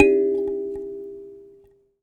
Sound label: Finger, Prepared Kit; Battery 3 Sample Kits